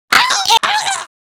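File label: electrocution vo; foley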